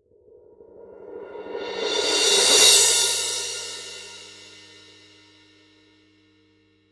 17 rock crash roll, paiste; pst 8

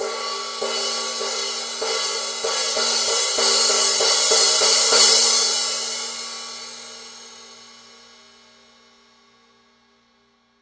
rude 16 crash-ride pattern, paiste

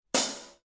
15 blue heavy hi-hat closed, paiste; color sound 900

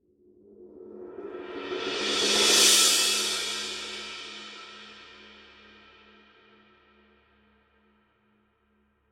20 dark crash roll, paiste; masters

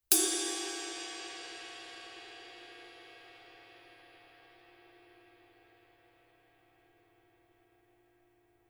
900 series 22 ride body, paiste

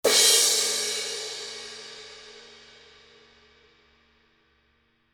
paiste signature traditionals 17 thin crash edge

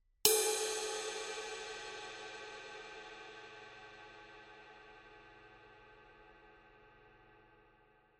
signature 20 mellow ride body; paiste

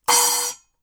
900 series 10 splash choke; paiste

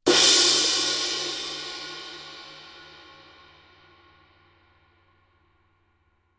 20 thin edge; paiste; masters

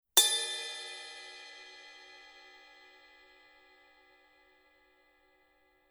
900 series 20 ride bell; paiste

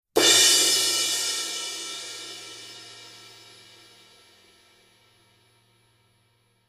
giant beat 22 multi edge, paiste

